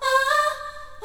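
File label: voice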